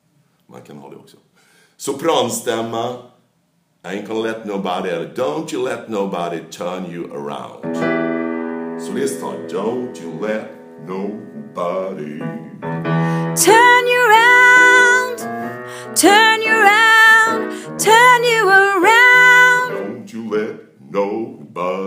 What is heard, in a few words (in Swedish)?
Sopran